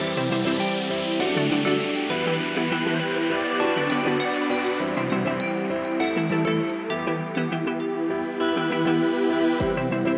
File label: Main Loop; the initial